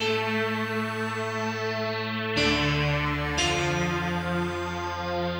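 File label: piano, Hip Hop and R&B Drumloops; Best Service ProSamples vol.01; AKAI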